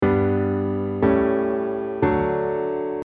D dim

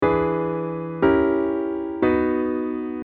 B dim

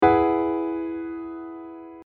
E add9

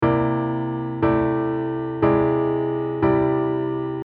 C9

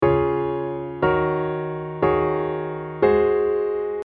C D sus4

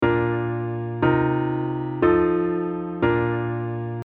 A B sus4

